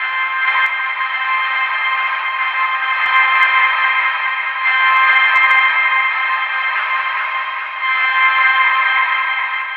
Under Water Hornz C3, Lounge Atmospherez

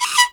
HUNT PIPE, ZAIRE PIPE; Spectrasonics; Heart of Africa 1

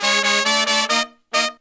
Pop & Funk Brass

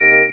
talk box eh; E-MU Producer Series Vol. 8 – Platinum Phatt

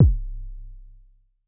K Quasi Sub, Vermona Mono15 Drums Kicks

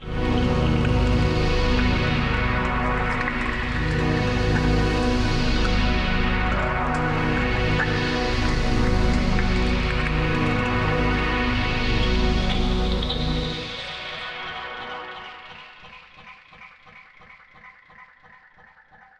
Pad; neuro; InsideInfo

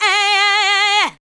ADLIBS 90; Ueberschall; Jam Box

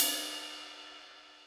ride, Zoom MRT-3B

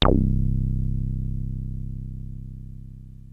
303 C1, TB 303

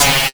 Trap Snare

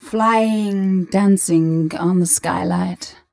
f mel a flat, fem Vocals

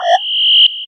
Mod Ring; Zero-G Jungle Warfare 1, 2 & 3; 90 s Sample CDs

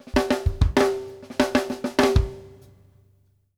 off snare, drum fills

